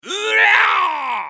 攻撃長; グルトルデ

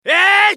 攻撃; ソホ(格闘型)

